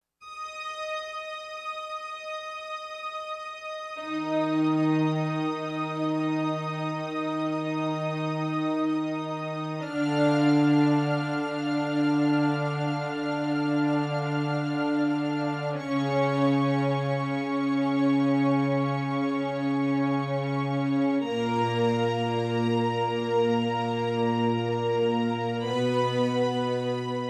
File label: Dark sinister drone